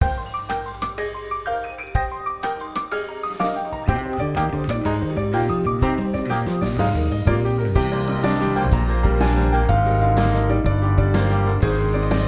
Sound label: bgm mecha battle